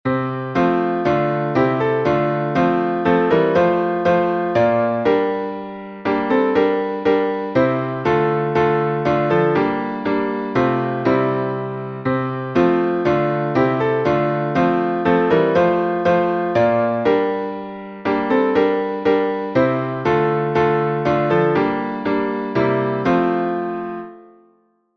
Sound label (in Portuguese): salmo playback